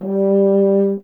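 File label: brass French horn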